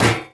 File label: physics turret gibs; Portal 2